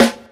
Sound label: studio hip hop acoustic snare sound a sharp key